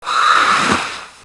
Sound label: Water Elemental Death Sound